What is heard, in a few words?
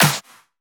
Snares; Vengeance Samples